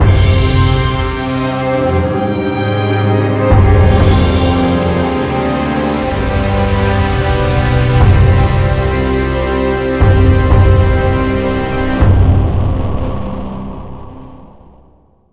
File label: Jingle Song Loose; Spider-Man: Battle for New York